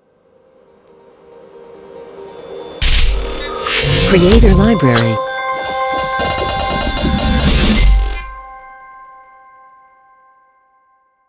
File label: Modern Electronic Logo; Logo-Idents